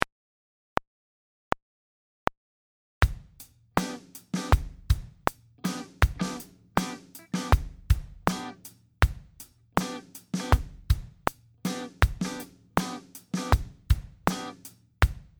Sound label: groove r&b